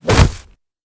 blunt hit low; Mount&Blade Warband

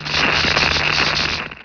REPAIR VEHICLE SPARKS; Emperor - Battle for Dune

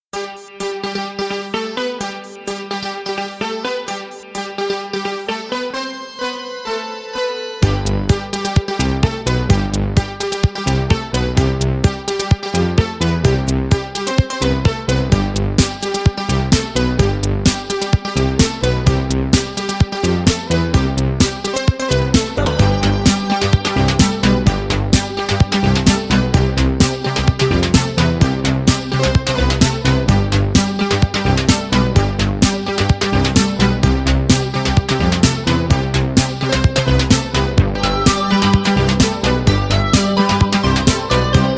Synthesiser

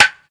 hit wood metal; Mount&Blade Warband

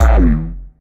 shield; AssaultCube; Alien Stars

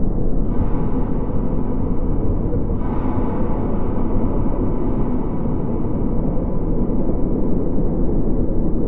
AMB Cavernous; Duke Nukem Forever

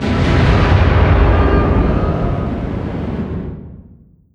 distance super heavy battleship damaged attack; Hearts of Iron IV Death or Dishonor

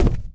cardboard, footsteps, run; Left 4 Dead 2

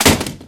weapon impact hard, metal; physics; Garry's Mod; hl2

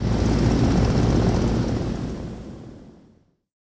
Airship Run; Sid Meier's Civilization 4 Complete; Beyond the Sword; Units

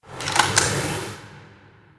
bonus; Alawar; Crusaders Of Space 2

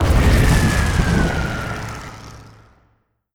distance airplane burn; Hearts of Iron IV Death or Dishonor

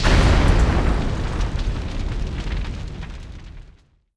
City Explosion; Sid Meier's Civilization 4 Complete; Warlords; Units

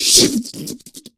smoker tongue hit; Left 4 Dead 2

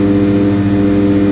fluorescent lights loop; spacial loops

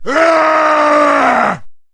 man short yell; Mount&Blade Warband

